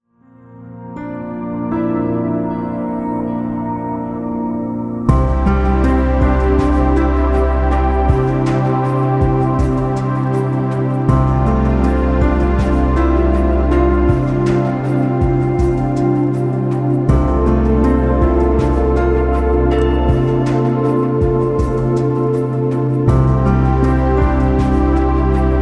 Relaxing

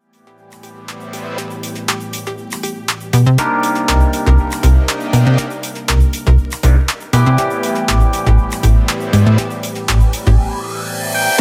Deep House Lounge Background

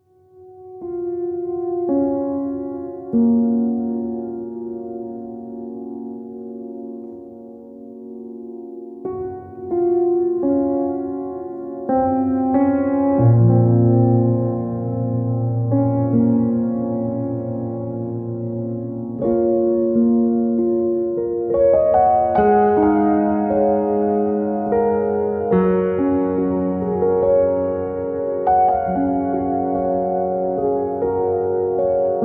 Deep Meditation Piano

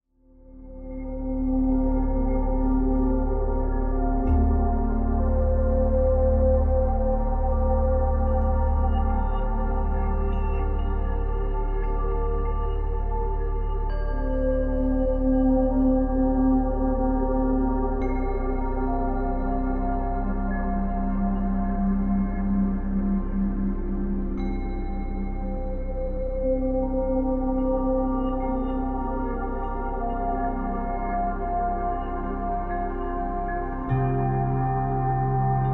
Deep Meditation 3D RELAX

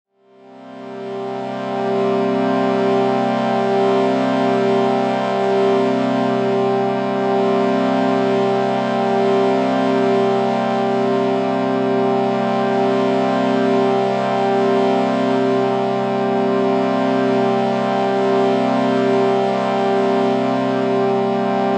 Shruti Box C G A# C 440Hz

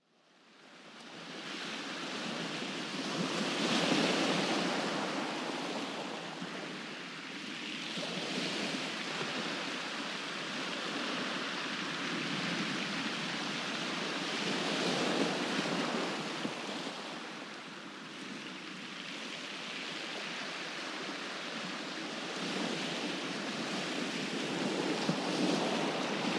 Beach Waves

